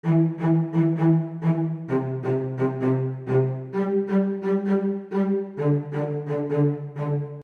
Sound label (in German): streicher staccato